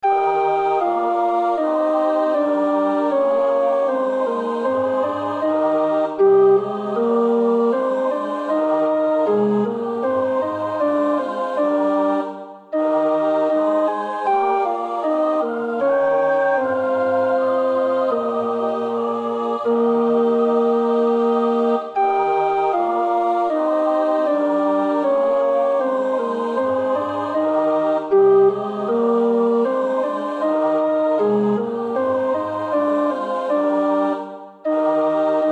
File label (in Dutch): tenor, koorpartij oefening; Bach (Johann Sebastiaan); BWV 245 Johannes Passion; Ach Herr, laß dein lieb'